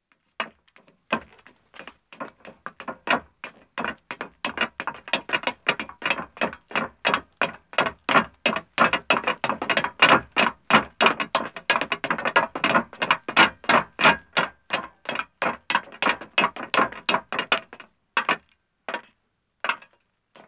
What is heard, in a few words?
Metal Stairs Ascending, 2 Men, Footsteps; BBC Sound Effects Library; Footsteps II